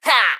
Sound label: Pecheneg Archer Attack